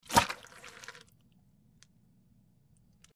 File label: Mud Plops Movement